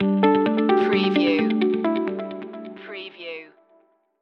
corporate technology stinger

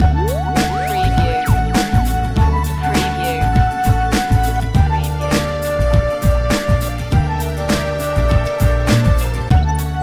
easytronica

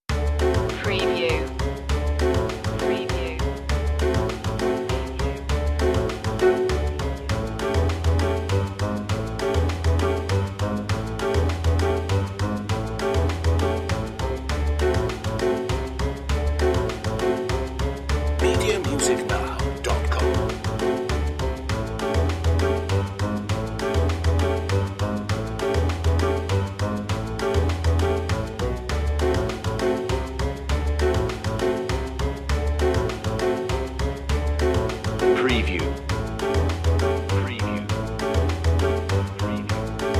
quirky moves underscore

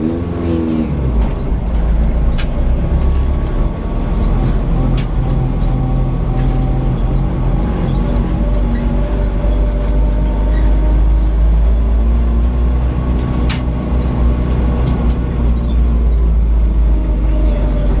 Rally car low speed loop; beanstalk audio

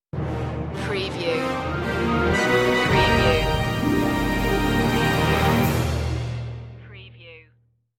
business stinger

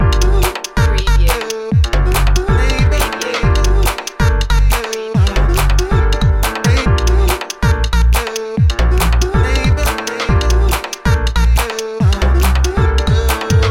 keen tweaks loop

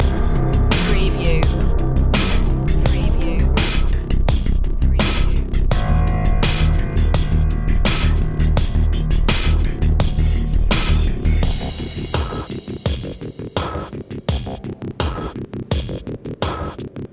broke apart inside loop

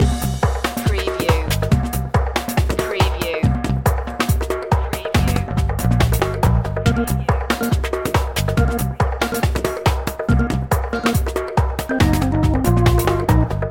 leslie jumping loop

